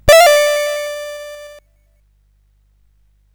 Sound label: sid CSG8580R5